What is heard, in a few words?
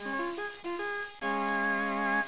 ORGAN; Image Bonanza; Expert Software; music